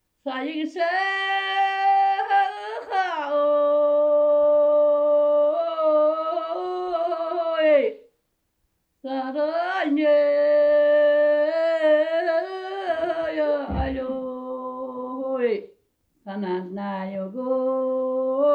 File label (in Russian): поет; п. Комсомольский